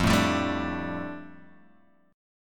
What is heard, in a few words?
F 6th Add 9th F down Guitar Open G, chord